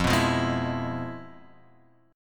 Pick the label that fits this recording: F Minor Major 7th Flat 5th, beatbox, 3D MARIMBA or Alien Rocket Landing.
F Minor Major 7th Flat 5th